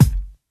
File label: Kick Sample F# Key, Royality free kick drum one shot tuned to the F# note.; F sharp; kick drum samples